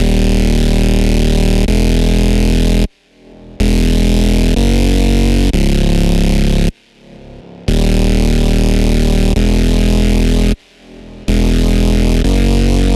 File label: Gritty Fourth Bass